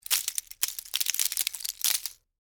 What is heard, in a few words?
Texture, Debris, Spill, Sugar, Cone, Waffle; Debris Sound Effects; Blast Wave